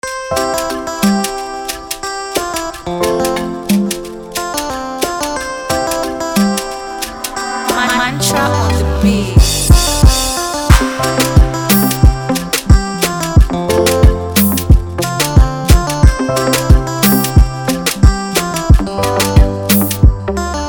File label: AFRO ZOUK BEAT